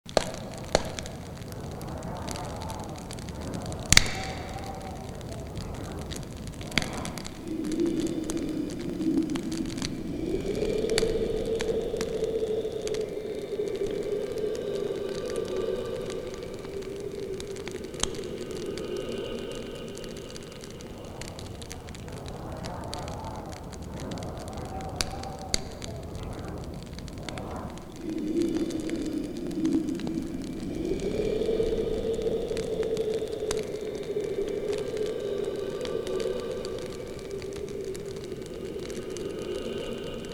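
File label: zvuki nochi, night sounds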